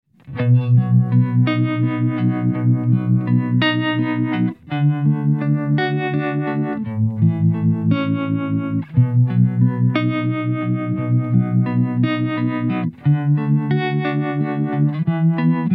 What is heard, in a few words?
Vintage Pulse; Strymon Compadre → Strymon Iridium → Strymon Flint